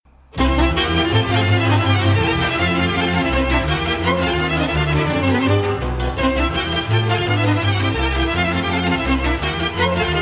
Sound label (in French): Ardeleana Invartita, Roumanie